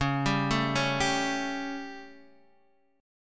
G Suspended 2nd Flat 5th Db, chord

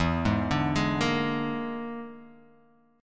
E 7th Flat 5th, E7b5 chord; arpeggiated

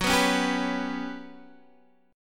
G Major 7th Flat 5th Gb

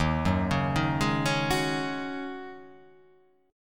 Eb Minor 11th Eb down; Ebm11 arpeggiated; JGuitar

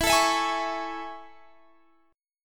A Major 7th E

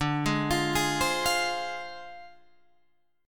D Minor 7th Flat 5th Guitar Open G

